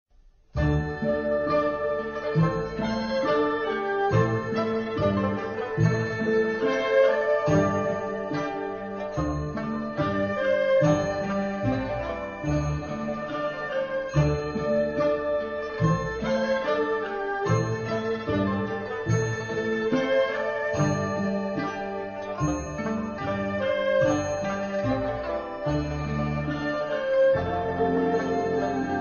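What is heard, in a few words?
Japanese melody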